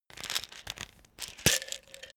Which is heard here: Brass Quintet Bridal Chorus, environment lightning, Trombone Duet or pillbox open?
pillbox open